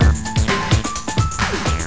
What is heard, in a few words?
music; 背景音樂; Flash